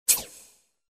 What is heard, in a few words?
category